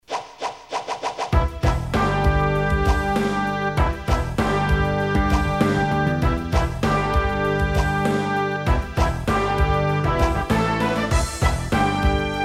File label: Rap